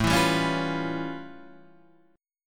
A 7th Flat 13th; A7b13 strummed; Guitar; JGuitar

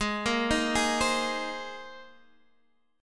Bb 7th Suspended 2nd Suspended 4th

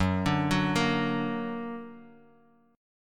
F sharp Augmented F sharp; F#+ arpeggiated

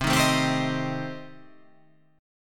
C Minor 6th C

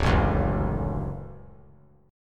D Major 7th, chord; strummed; JGuitar

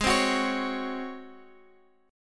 C sharp Major 7th Suspended 4th G sharp

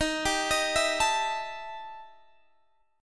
E Minor Major 7th Double Flat 5th, Eb

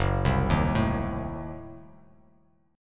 F sharp Diminished 7th F sharp Bass Standard; arpeggiated; JGuitar

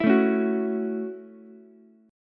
A 6th, Ukulele; strummed

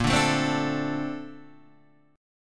Bb Major 9th, Bb, down, Guitar, DADGAD

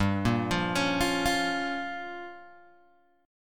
G Minor 7th Flat 5th, Guitar, Open G; chordshape; down

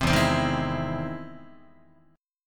E Augmented Major 7th E down Guitar; E+M7 strummed; JGuitar

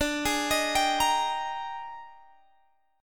A sharp 13th

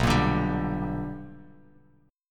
B Minor Major 7th Sharp 5th Bass Standard

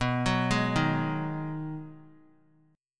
B 6th B down 3C 3F 3A 3D, chord; arpeggiated

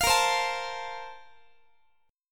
A sharp Major 7th Suspended 2nd, Guitar; strummed

